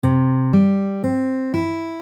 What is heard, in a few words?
fingerpicking pattern, Gitarre